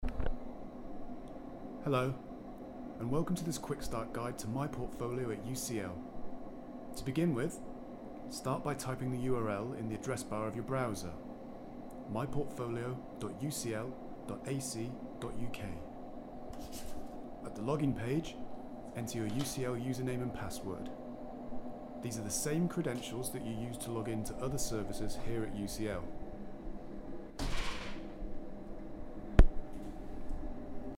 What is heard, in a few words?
with hum mixdown